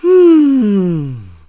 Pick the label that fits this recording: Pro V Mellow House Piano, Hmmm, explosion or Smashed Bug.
Hmmm